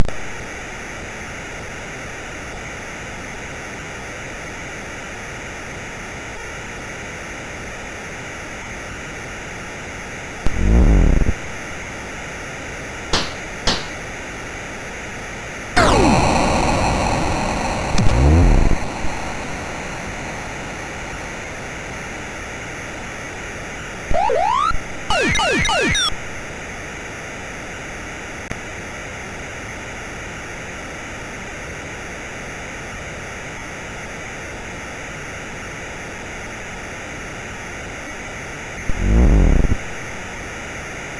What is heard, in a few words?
Big Funk plus FX; MOS6581R2